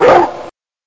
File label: Large Dog Bark